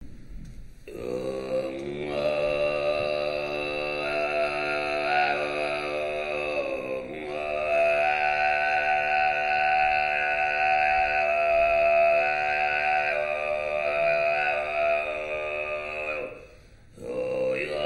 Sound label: kargyraa